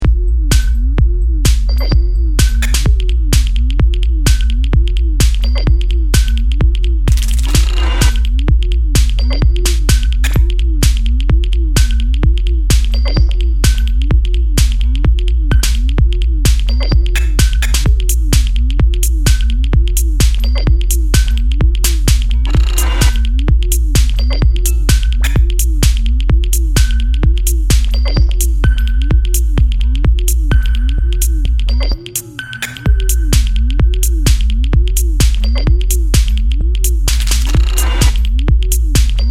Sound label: octave first impression